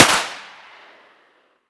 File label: bizon suppressed fire; weapons